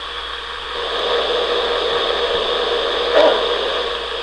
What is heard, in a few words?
bedroom bark